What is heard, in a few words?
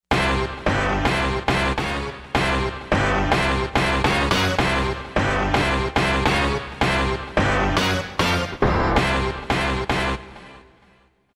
Chord Hit; Roland SRX-05 Supreme Dance